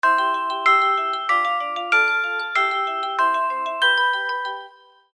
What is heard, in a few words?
Chiming tone; Samsung SGH-i780